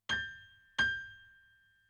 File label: PIANO, RNR for piano